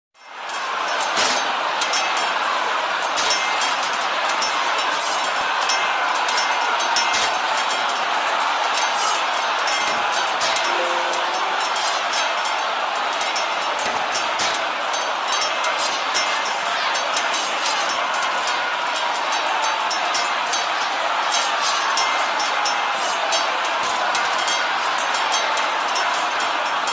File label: Ancient army